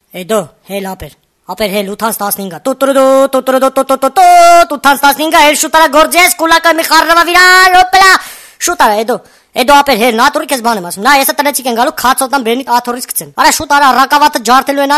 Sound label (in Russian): muzyka dlya budilnika armyanskiy budilnik